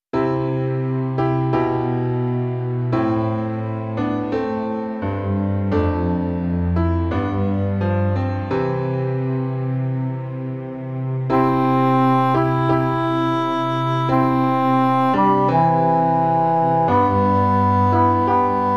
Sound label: harmony